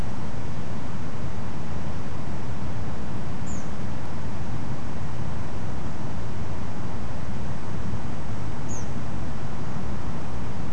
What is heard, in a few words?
Olive backed Pipit two calls; nocturnal flight call project in beijing autumn 2021